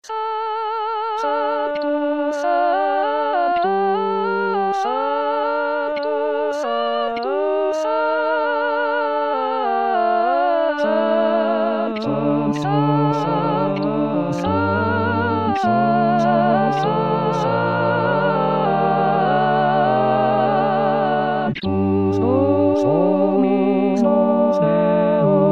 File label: Sanctus Tutti; La Messe O magnum Mysteriumde Tomas Luis de Victoria